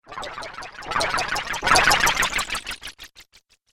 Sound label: Cartoon Magic Spell